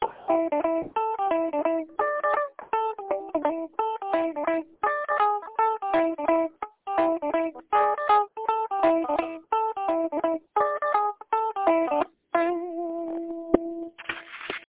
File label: could be chirpy bird